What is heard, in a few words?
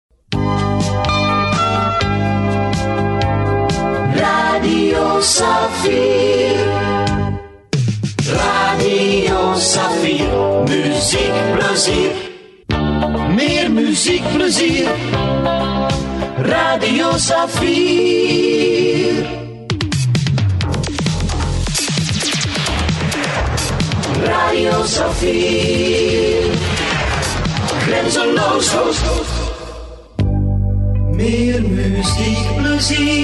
jingles; Saffier Wuustwezel